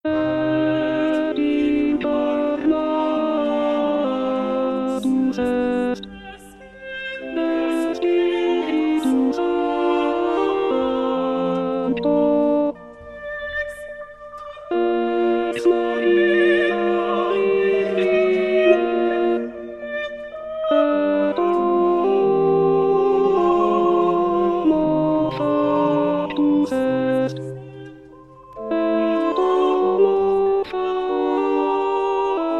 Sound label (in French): Credo alto; Stage Zelenka 2023; zelenka zwv21